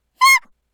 kya; gaya

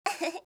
"ufufu", kanjou

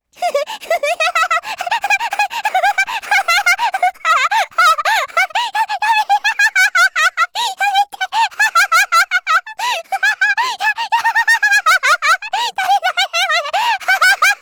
"ahahahahaha"; kanjou

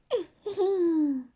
"ehhehe", kanjou

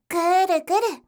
guuruguru; dousa

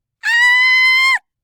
aaaaa; gaya

